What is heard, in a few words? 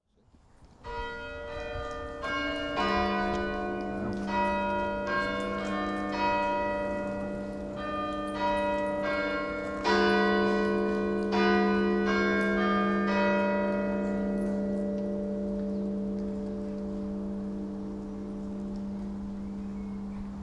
Church Bells